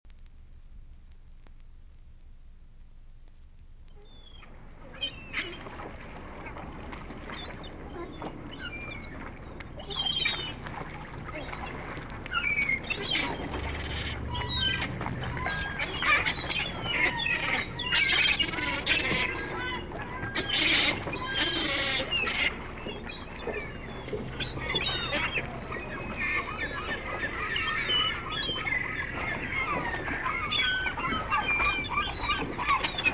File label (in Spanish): gavio